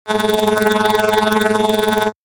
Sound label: Phasing Pulsing Buzzer Double Speed